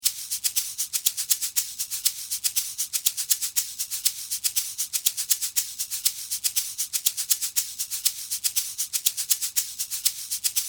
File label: shaker